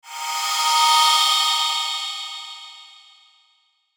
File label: SHIMMER RING